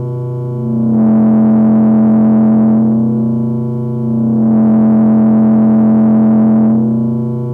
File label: StarShip Sounds